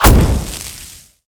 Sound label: searing impact; weapons; dota; clinkz